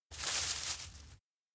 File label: grass rustle soft; anemoi